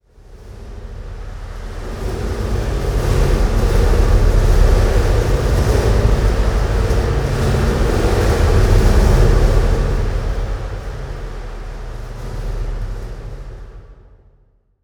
environment temple winds stinger indoors; Aragami

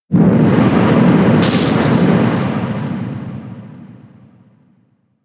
Blizzard; pokemon sfx gen 6 attack moves xy oras

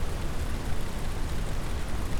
WATERFALL Close loop

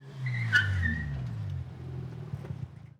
Car Stopping

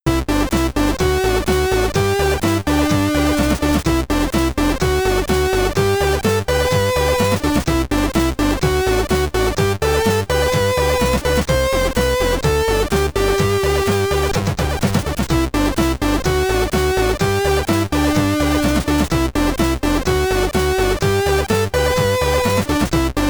Ending (SID Stereo), commodore 64; Eskimo Games

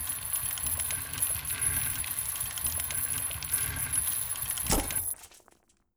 Wood Door Open; Math Puzzle

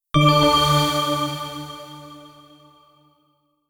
Jingle Skill Learned

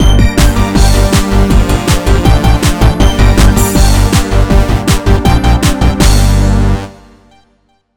Race Lost; Ferrari World Championship 2009; J2ME mobile

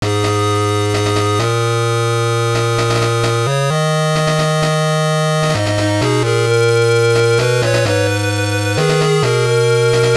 BGM; Tom Clancy's Rainbow Six; GBC

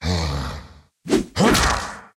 Giant Attack

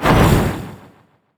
uppercut windup

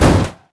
Impact

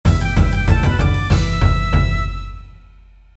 Jingle Game clear; Katanuki; dsiware 2009 nds gamerip

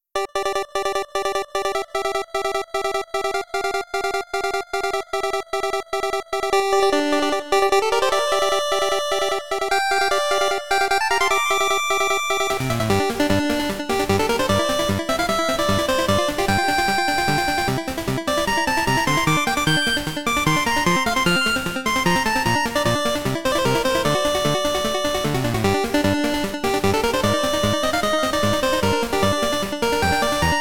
8bit; Hyrule Warriors Definitive Edition

